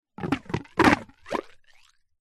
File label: foley liquid pipe plastic flexi gurgle short bursts water movement inside